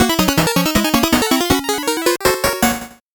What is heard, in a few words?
8bit jingle normal